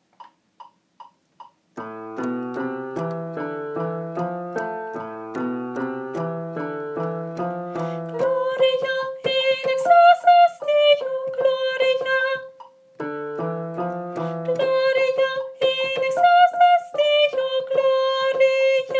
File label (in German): Gloria, Tenor; Tenor (Teil 1); Messe; Chor